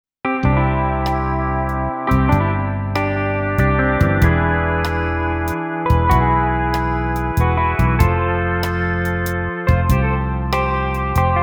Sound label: country; Multi-patch demo 3; xp-80; roland demo cd